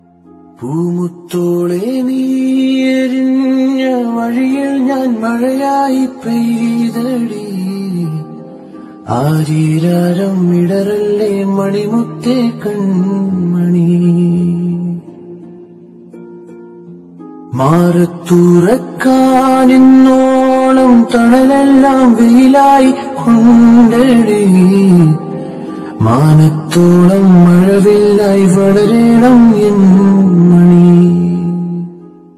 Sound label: Malayalam Movie Ringtone; Joseph